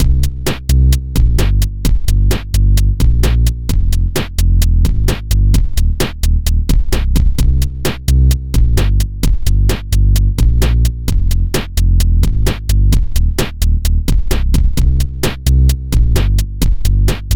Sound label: pause, music